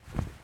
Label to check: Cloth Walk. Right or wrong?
Right